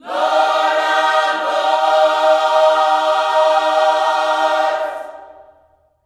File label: LORDS, Gospel; Spectrasonics; Vocal Planet Vol.1 Gospel; HALLELUJAH